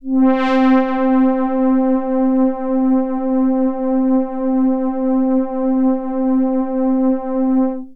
Prophet 5 LEAD Magic Low - C; One Shots; Cymatics; Fantasy Synth Sample Pack

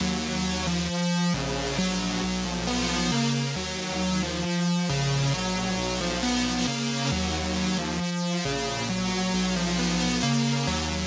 135 BPM D# Min Synth, Loop Stems; Melody; Cymatics; 2022 Melody USB Expansion; Serotonin

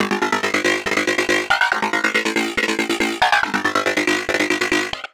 Lead, Dubstep and Trap

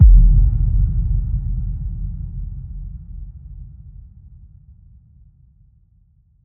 Riddim Impact Ominous; One Shots; Ghosthack; Riddim Essentials 2020

